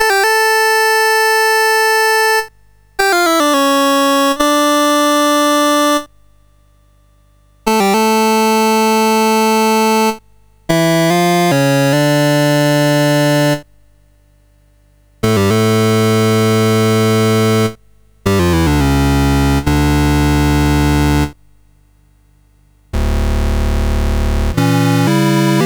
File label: Toccata and Fugue in D Minor; CSG8580R5